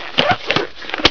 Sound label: RUBBER ON